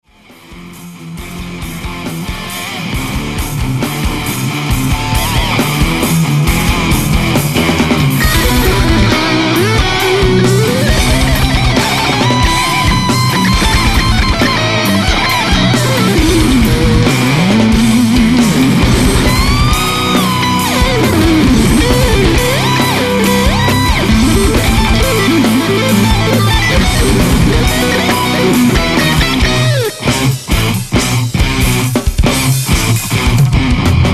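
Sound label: alto sax praise solo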